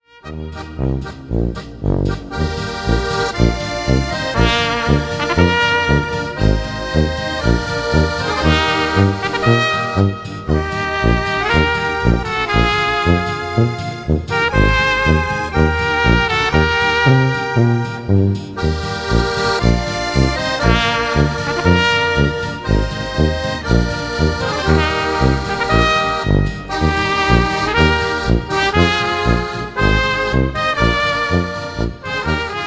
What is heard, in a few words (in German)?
polka; amsel